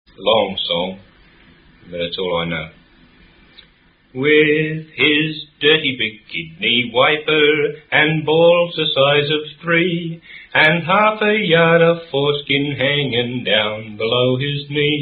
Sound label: Highland Tinker - Chorus Only; john meredith rob willis collection